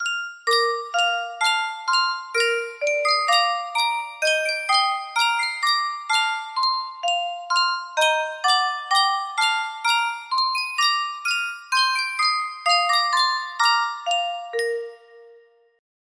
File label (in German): sankyo spieluhr